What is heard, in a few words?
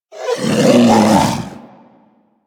Predator Vocal Alerted; Warframe; Hunting Critters